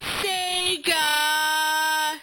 sega 8bit

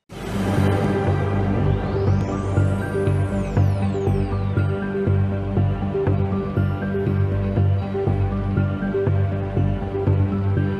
intense; family feud

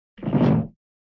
bassy bodyfall

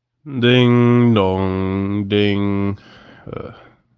ding dong ding

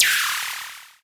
open hat analog

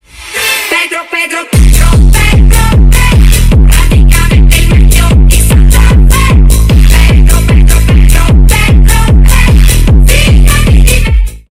bass boosted

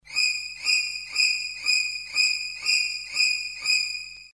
suspense classic

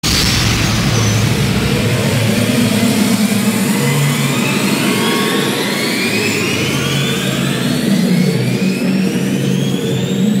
golden buzzer; americas got talent

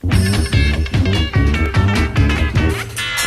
guitar riff

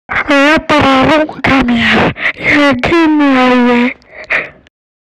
ragtime

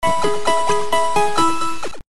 pokemon healing sound

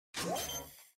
defuse spike; valorant

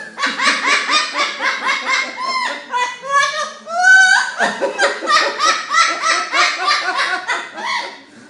papagaio rindo e falando palavrao laughing parrot

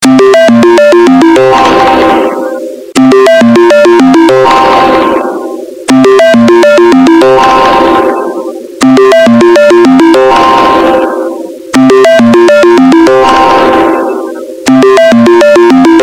snapchat call bass bass bass

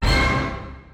roland orchestra hit

